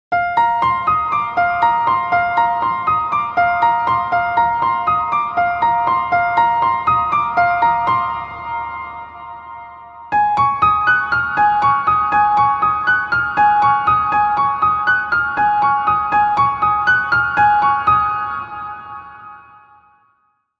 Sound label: best piano ringtone